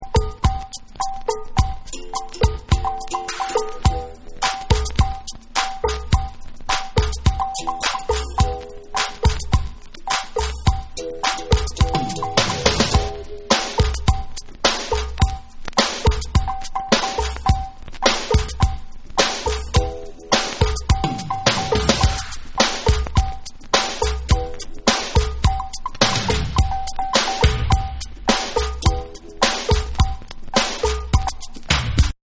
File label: Bonus Beats